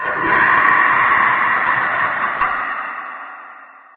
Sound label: inside battle zombie, prison; ambient; source engine; hl2; video games